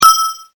handbell

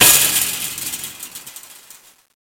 chainlink

